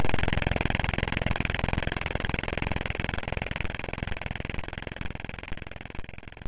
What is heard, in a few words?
BASIC, MOS6581R3